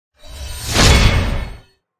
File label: attack gold; effect; wulingzhu